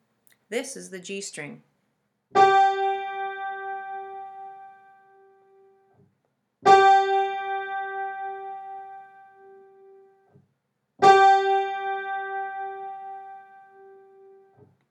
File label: G string; close to sky